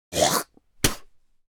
Human mouth gargling and spit

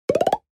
Multiple pop

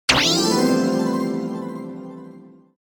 Casino slot machine bonus win

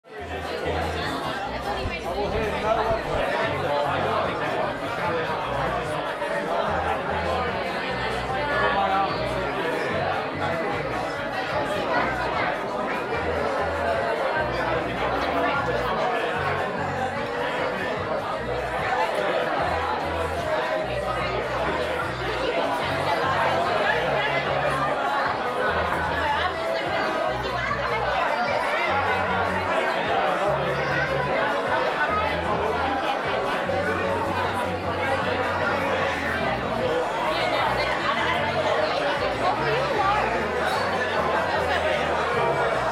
Crowd and dining noise in busy restaurant

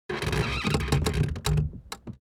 Wood short and heavy creak earthquake designed

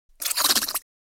Cartoon bubbles squeaking

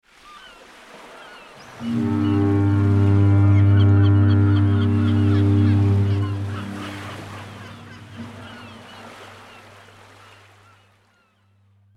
Ship passing by fog horn distant perspective

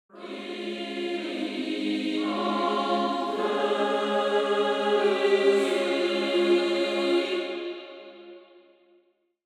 Angelic choir angels; radiant purity

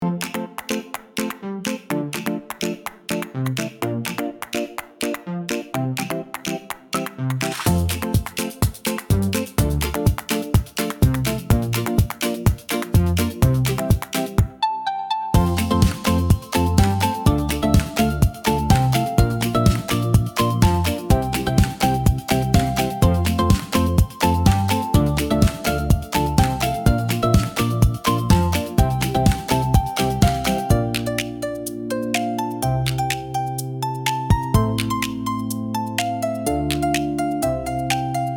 Uplifting pop beat positive energy

